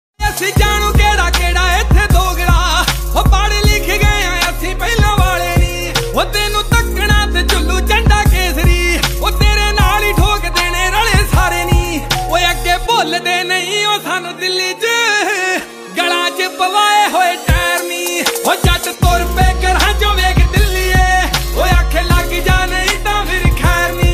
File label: Dance Punjabi Ringtone